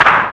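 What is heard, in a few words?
PERCUSSION WHIP, Techno Perc; Roland L-CD701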